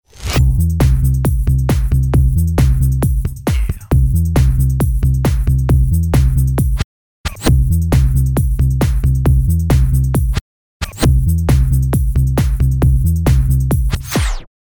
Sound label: BED Smooth; Yeah Its Smooth